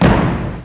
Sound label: bodyfall